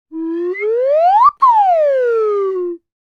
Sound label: cartoon slide whistle ascend then descend